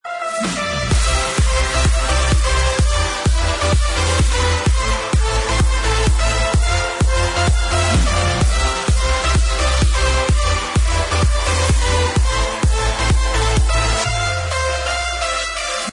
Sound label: turn Bgm; Brazil; slotox